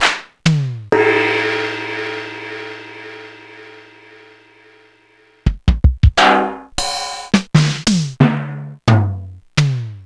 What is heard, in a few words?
EMU E-Drum